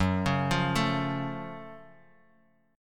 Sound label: Gb Minor Major 7th, Guitar, DADGAD; 4,4,3,2,x,x; down; chord shape